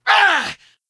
Attack; Keleseth

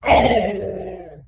Crusher Wound Crit; AnubarCrusher

